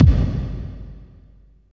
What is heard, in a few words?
KICK, Tekno Industrial; East West